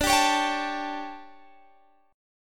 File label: D-Major 7th Suspended 4th, D; strummed; chord